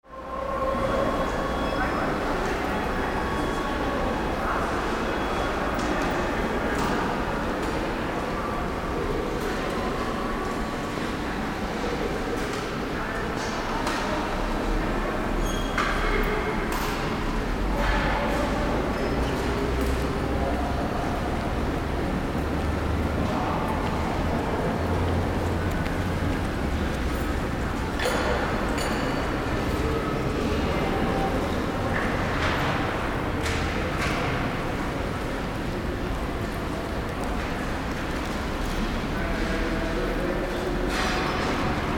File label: railway station entrance hall